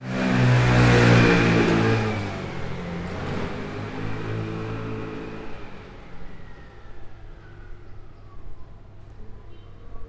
Scooter Passing

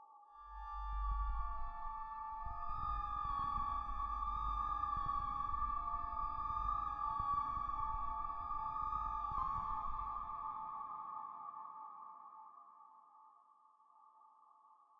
Eerie Strings

